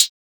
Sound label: closed hihat, drum one shots; Cymbals; Hihats - Closed; Cymatics; Cobra Hip Hop Sample Pack